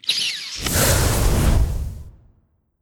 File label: Succubus Kiss